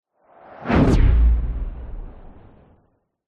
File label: fantasy magic spell whoosh ball of energy pass by fast